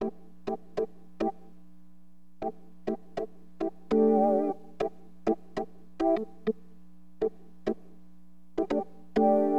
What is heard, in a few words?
ORGAN; LiveAct Pool; AcidJazz Funk; Keyboards